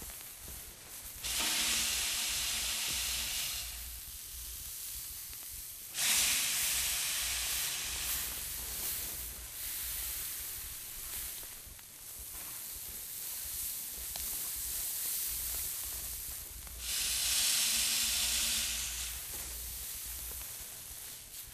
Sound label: backwater rice fall; Titanfall 2